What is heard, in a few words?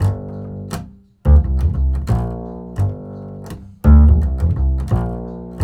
acid G, 85 acid swing, bass; Spectrasonics; Bass Legends